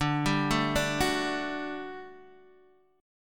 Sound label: G Major 7th Suspended 2nd D